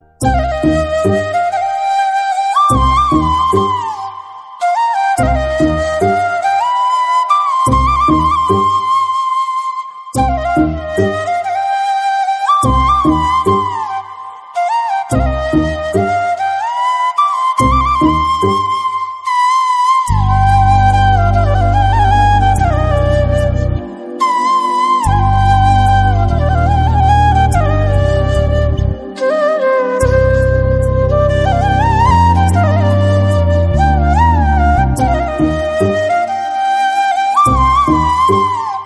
hearttouching romantic flute

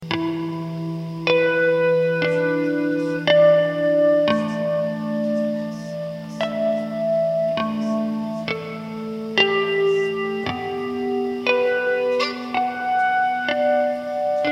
harmonics

